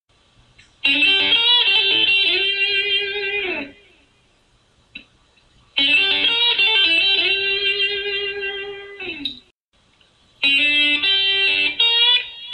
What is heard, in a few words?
quick blues intro lick